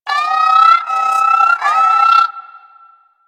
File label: Armadillo Lure Call; Characters; Warframe; Hunting Critters